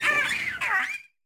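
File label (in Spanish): "Grito de Squawkabilly", Pokémon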